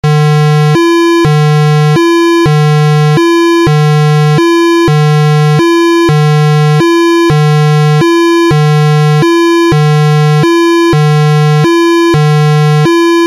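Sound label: Siren Tone HILO (RUMBLER); UNITROL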